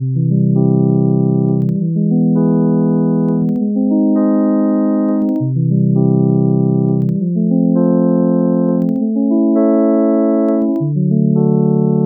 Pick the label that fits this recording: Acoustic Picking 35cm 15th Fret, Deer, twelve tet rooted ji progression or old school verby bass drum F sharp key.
twelve tet rooted ji progression